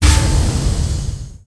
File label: stone curse